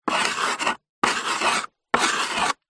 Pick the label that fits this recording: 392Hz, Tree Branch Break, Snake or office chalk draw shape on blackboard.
office chalk draw shape on blackboard